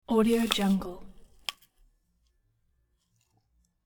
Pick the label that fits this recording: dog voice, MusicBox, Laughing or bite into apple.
bite into apple